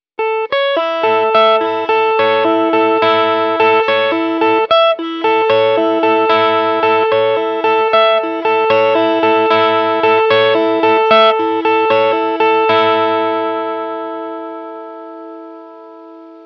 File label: arpeggio